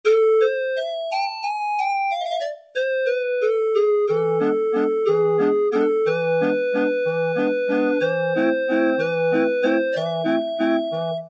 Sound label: Calliope, Roland; Roland PN-D50-01